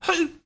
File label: piglette damage